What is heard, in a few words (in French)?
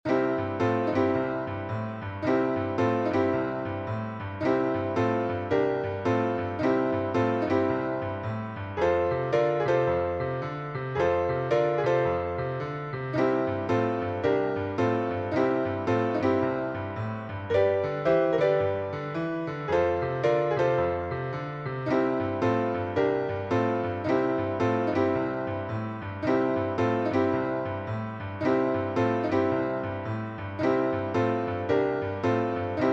boogie; blues piano; Accompagnement Main Gauche